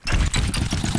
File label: chaingun start, weapons; Tribes2